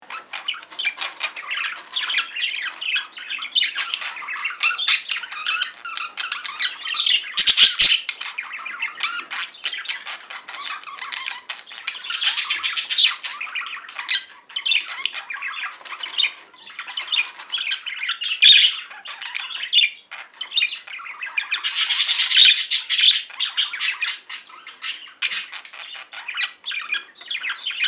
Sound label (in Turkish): muhabbet kusu kur sesi